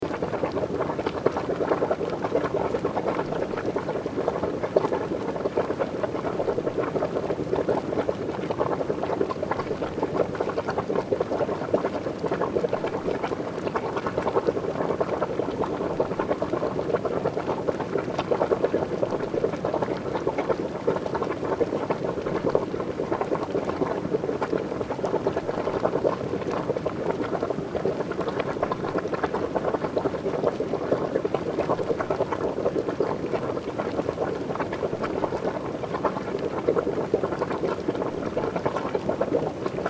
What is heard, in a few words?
hot spring